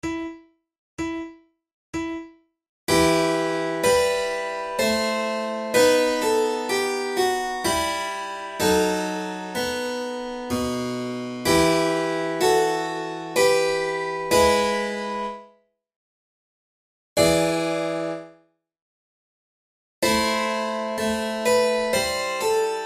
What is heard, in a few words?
flute sonata in e minor grave; handel; hwv375